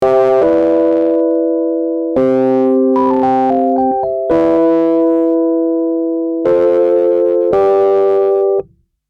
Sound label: rhodes distorted; waves